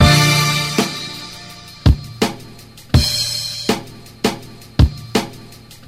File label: horn blast, boombap drum loop; Drum loops